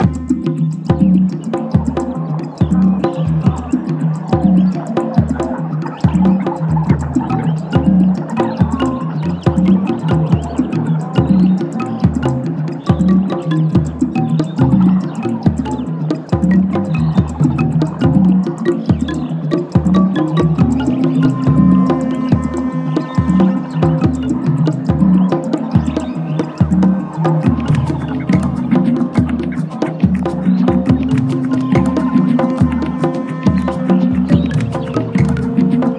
Jungle Drums Style; RollerCoaster Tycoon 1 2

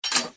City Building; the great ottomans strategy battle for throne android

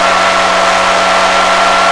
Gearwhine; Smashy Road Arena android ios gamerip 2016

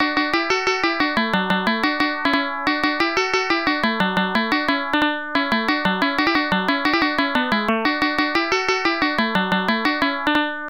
Arcade ~ Stage Clear; pepen ga pengo genesis

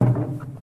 footsteps metal walk

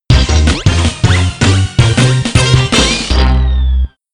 Fanfare- Congratulations!; lego racers 2

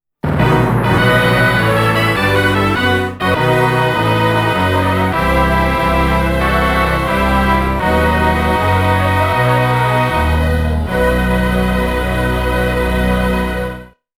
LAST ROUND CLEAR; Capcom Belt Action Collection

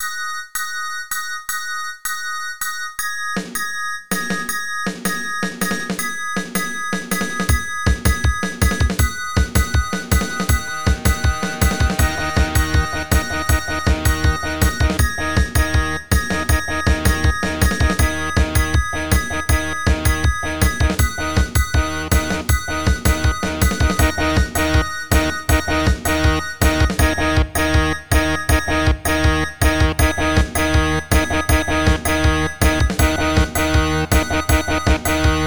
music ice; galaxy hero android

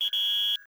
Whistle; tokimeki memorial taisen puzzle dama; konami gx; arcade

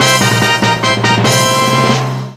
Success

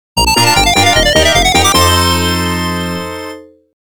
READY; Fairy Land Story; Story of Bubble Bobble Vol. I; arcade machine

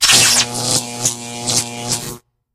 Pigs Electrocuted; Angry Birds

